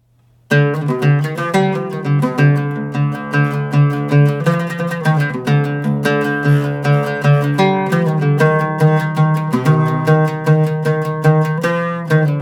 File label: Taqsim Bayati Practice, oud